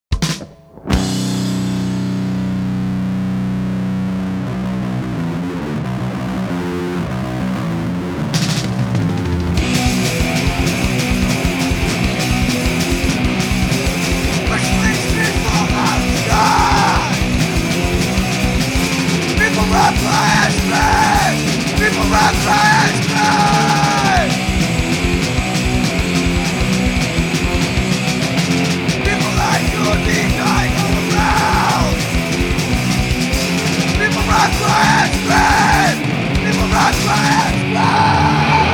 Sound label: Run Cry And Scream